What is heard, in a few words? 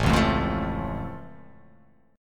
A Augmented A down